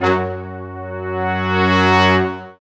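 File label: brass sections, large ensemble; Roland LCDP06 Brass Sections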